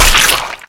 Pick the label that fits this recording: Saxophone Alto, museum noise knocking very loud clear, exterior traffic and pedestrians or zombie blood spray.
zombie blood spray